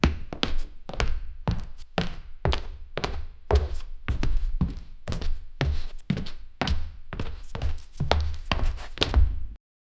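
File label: footsteps on a wooden stage, theater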